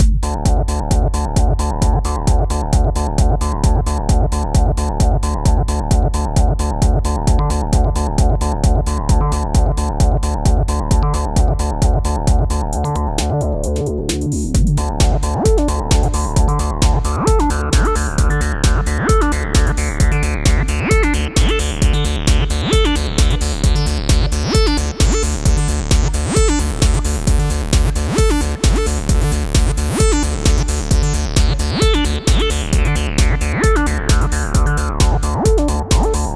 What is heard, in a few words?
midibox sid